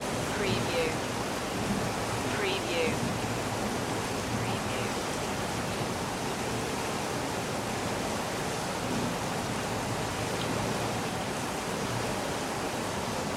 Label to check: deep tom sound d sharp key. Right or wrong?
Wrong